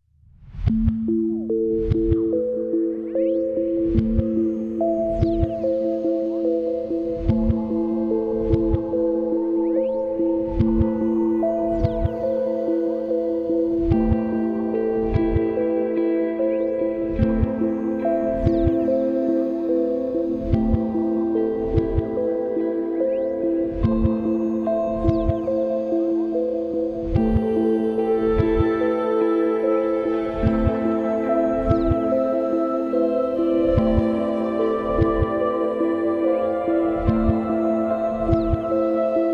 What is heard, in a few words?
moody cinematic